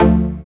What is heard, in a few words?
TECHNO A2; Jack Sparrow Deep Dubstep Progressions